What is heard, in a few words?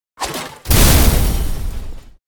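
chandelier attack, monster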